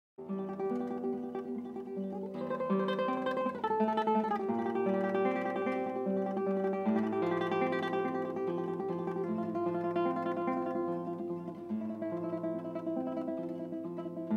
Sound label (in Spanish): último tremolo; Barrios